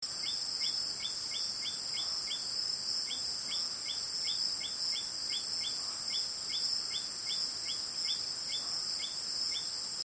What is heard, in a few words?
leptodactylus albilabris los haitises national park dominican republic; Hispaniolan population; softer in tone; West Indian